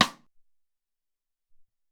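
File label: Room Stick, Alesis SR-18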